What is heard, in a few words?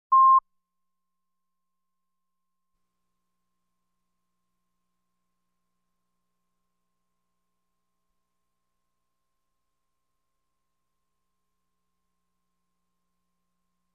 Guitars Only